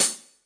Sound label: HH HEAVY CLOSED, Roland TD 7; DRUM MACHINES